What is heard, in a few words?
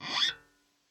scrape, glowly bass